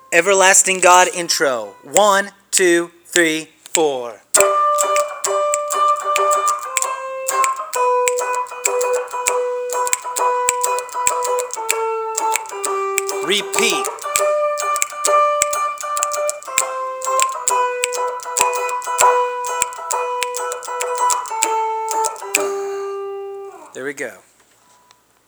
Guitar Line

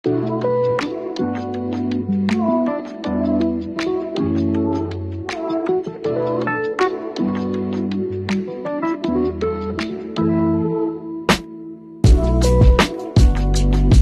Chill Distant Echoes Main

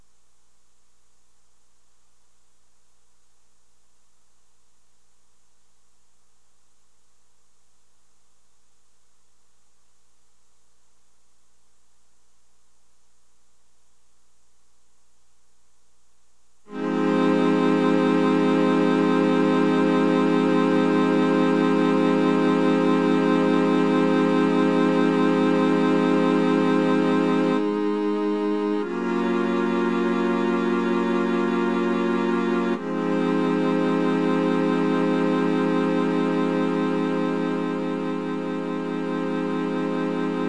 Cello Recording

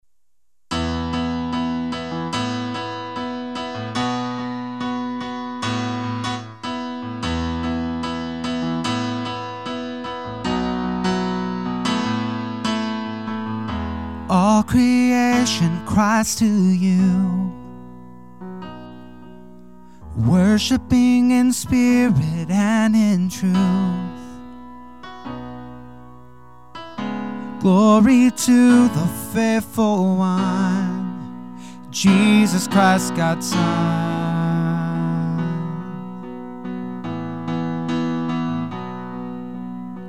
Tenor Lead Left; Verse1.2

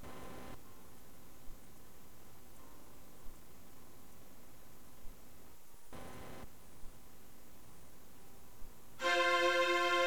STRINGS Recording

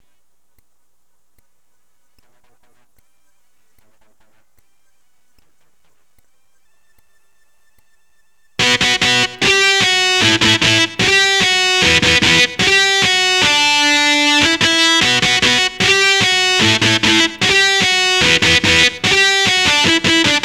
E GTR POW CHORD

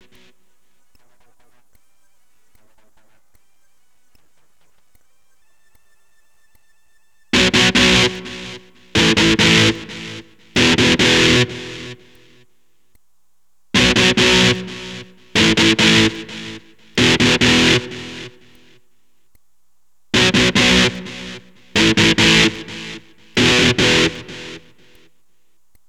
E GTR POWER CHORD

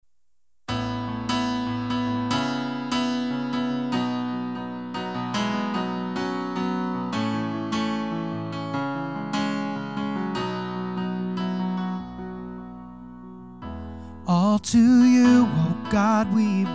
(Verse3) (Soprano Left), Vocal Helps

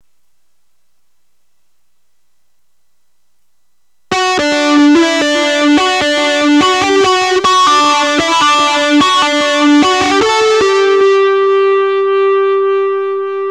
e gTR

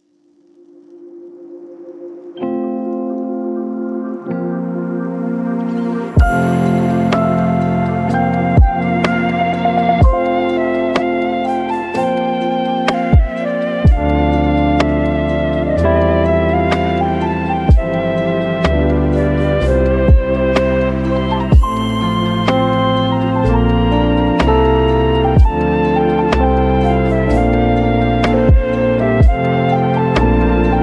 o come all ye faithful lo-fi beat version; Christmas Pre-Service

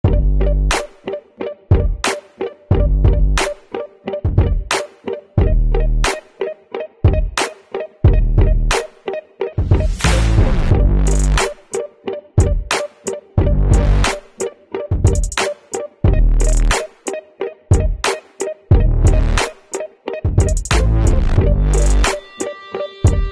Energetic Tune In

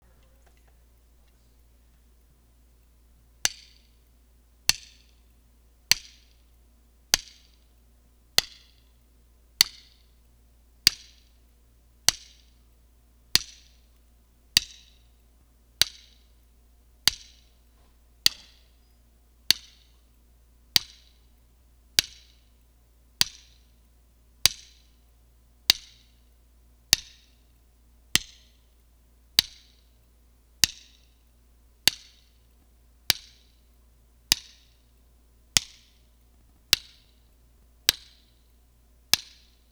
sticks Recording

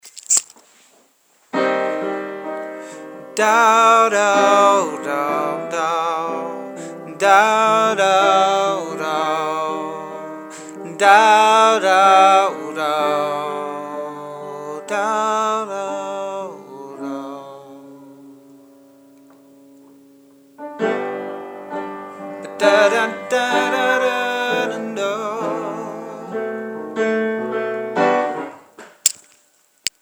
Melody

